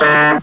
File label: honk